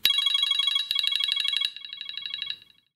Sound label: IPhone Beacon IOS14